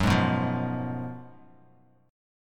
F sharp Major 7th Suspended 2nd Suspended 4th, down, Bass Standard; F#M7sus2sus4 strummed